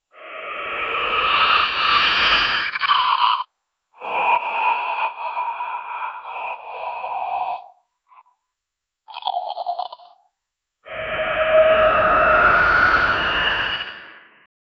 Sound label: avian